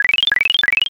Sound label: data analysis